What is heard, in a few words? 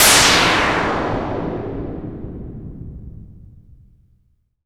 Downsweeps